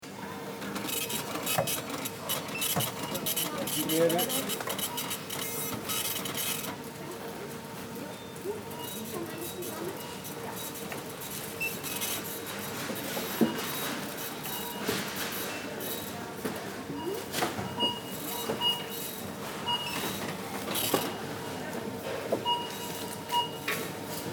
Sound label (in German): Supermarkt Kassen